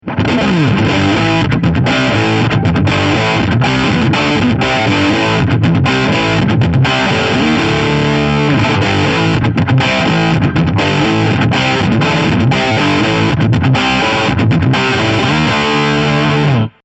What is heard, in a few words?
VOX AC100 Hard